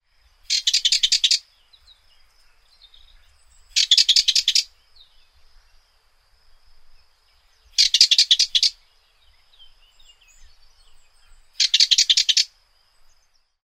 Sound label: sardinian warbler